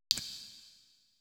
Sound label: bottle, ethno, 125